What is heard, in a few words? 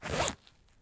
medkit open; item grizzly; Escape from Tarkov